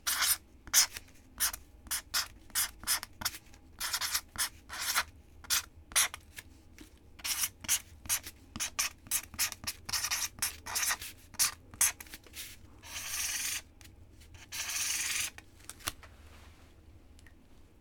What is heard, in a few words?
music stationary